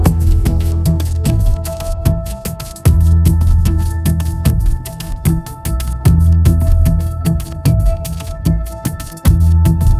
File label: breaks